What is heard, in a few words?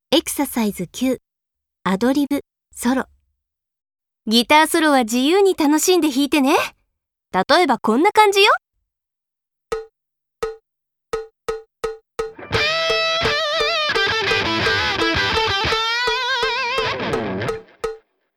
Solo Ad Lib; K-ON